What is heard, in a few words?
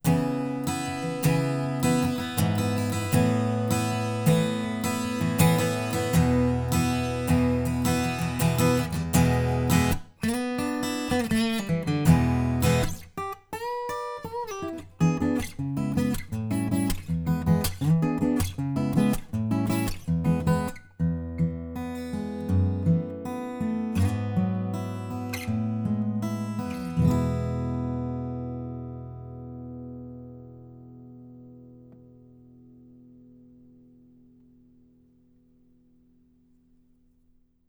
Ac Gtr